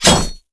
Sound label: Spear Metal, Damage